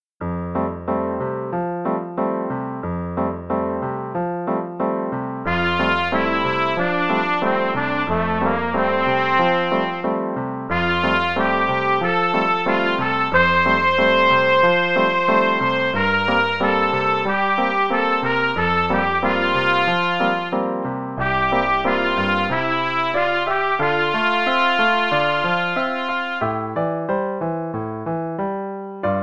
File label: no click, qn=92, no dynamics, trumpet; The Really Easy Trumpet Book; Aubade